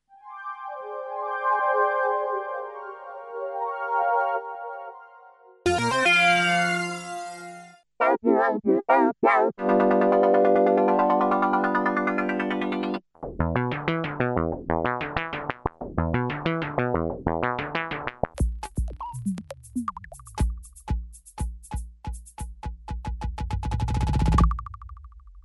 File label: nord lead, synth; synthar